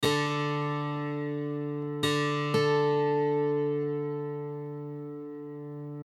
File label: Tritave; ragajunglism